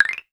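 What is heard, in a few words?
WOOD BLOCK; Voice Crystal - Steve Reid Definitive Percussion; CLAVE+WD GLS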